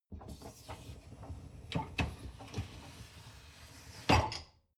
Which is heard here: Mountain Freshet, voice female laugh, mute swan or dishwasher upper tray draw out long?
dishwasher upper tray draw out long